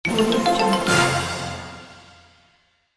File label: game reward; medusamusiclab